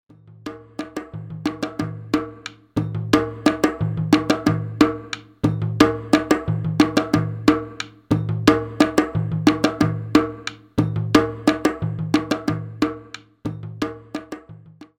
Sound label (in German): Solostimme, trommeln